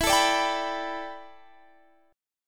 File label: A 9th Suspended 4th, E